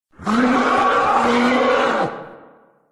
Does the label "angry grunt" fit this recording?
Yes